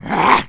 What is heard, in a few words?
zombie attack